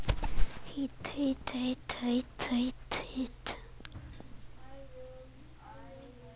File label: hit